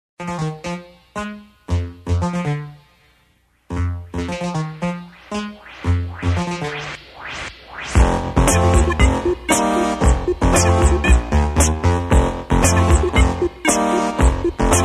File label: swing